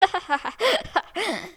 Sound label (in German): lachen